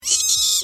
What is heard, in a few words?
screech eeee